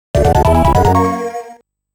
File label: Level Complete; Turrican Anthology Original Sound Version Vol. 1